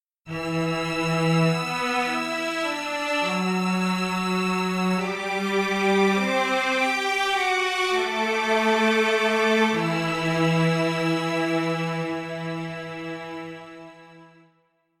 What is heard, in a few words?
legato strings